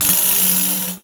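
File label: PANDEIRO, Percussion; Voice Crystal - Steve Reid Definitive Percussion; BRAZIL SET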